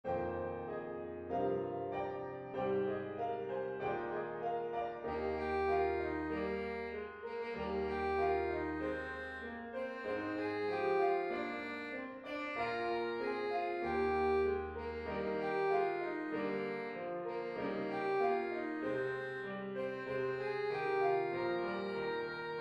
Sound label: jingle bells; pierpont james